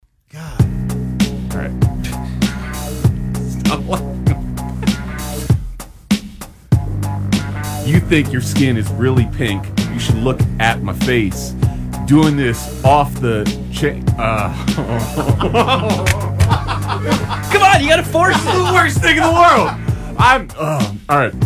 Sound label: rap battle